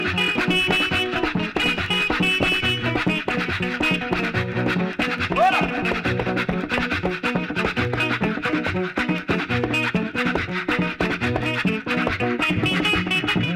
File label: merengue